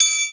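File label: percussion, Drum & Bass; Ueberschall; Drum & Bass Resonance; 150 BPM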